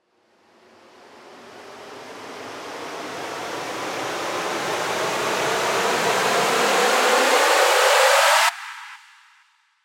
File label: Uplifter; revealed serum fx vol 2